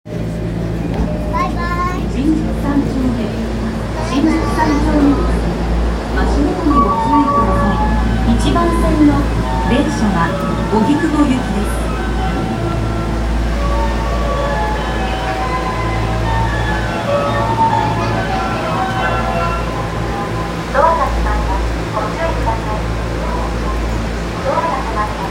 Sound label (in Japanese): 丸ノ内線新宿三丁目西